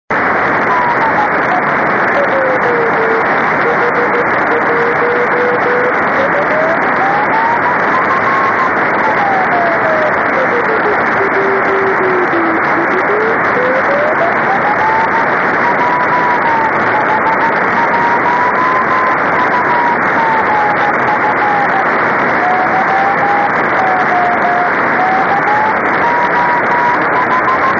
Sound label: 24G beacon; 晴れ、気温２２℃ 湿度６０％; JH1UGF→ＪＲ川崎駅ビル反射→回折→JN1AYV; JH1UGF 横浜市; JN1AYV 茅ヶ崎市